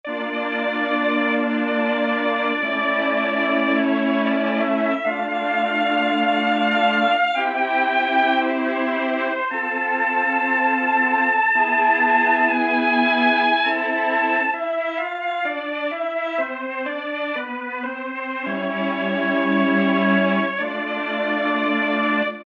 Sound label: Trn Str Dry, Roland SRX-07 Ultimate Keys